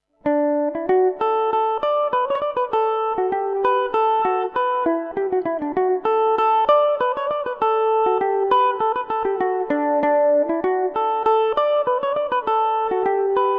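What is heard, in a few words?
D major revelation, Irish mandolin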